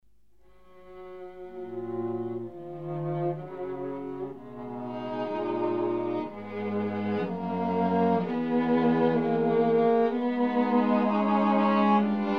Streichquartett e-moll Adagio

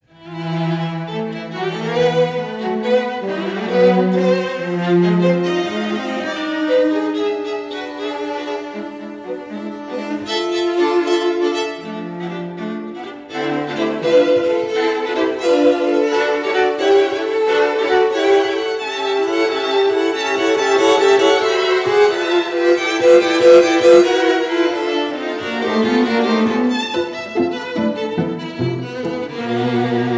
Streichquartett Allegro moderato

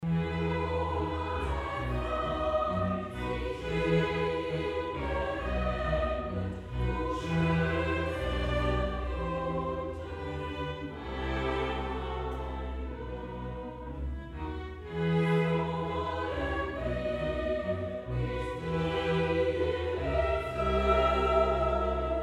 Chor und Solo Jungfrauen; Sulamith

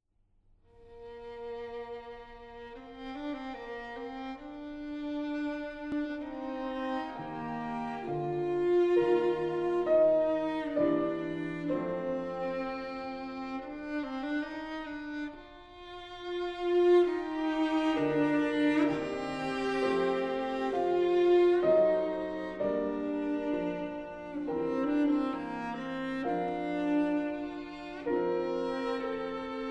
Getragen Klaviertrio

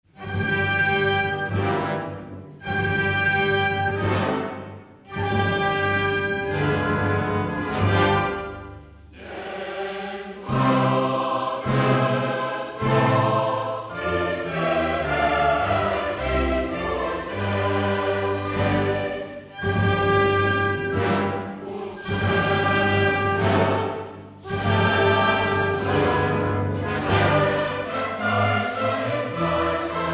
Chor

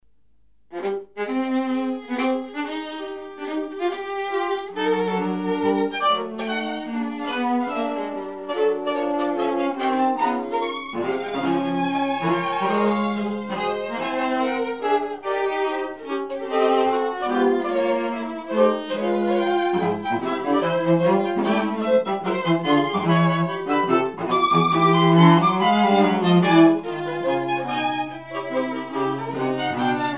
Streichquartett Nr 1 e-moll Scherzo

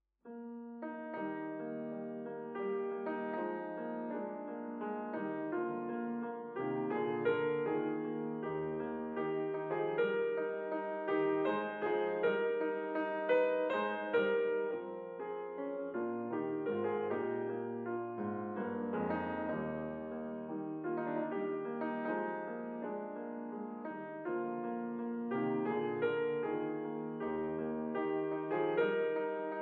Sonate

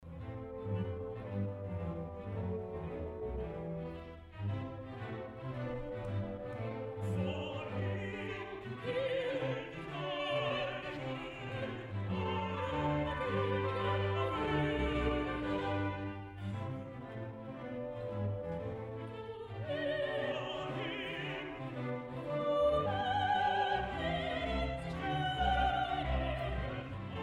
Duett; Sulamith und Salomo

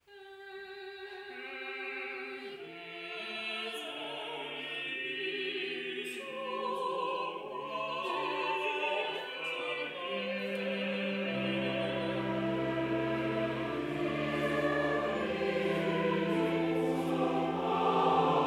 Wechselgesang Apostel und Juenger; VI Am Pfingstfeste

